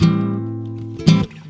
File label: GUIT CHORDS; Best Service; World Colours